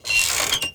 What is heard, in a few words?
Squeak Metal Cage Swing; SFX Kit